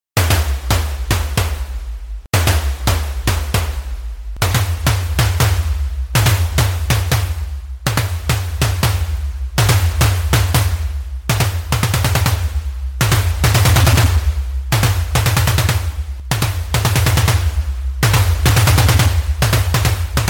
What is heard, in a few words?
Cargo Train